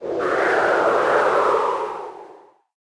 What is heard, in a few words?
Wind